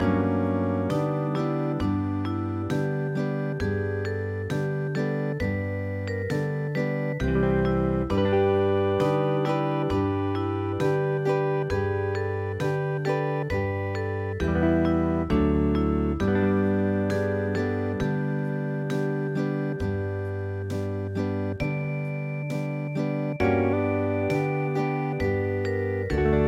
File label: hawaian